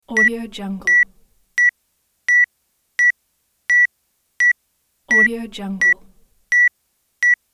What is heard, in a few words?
interface beep repeated sequence; interface sounds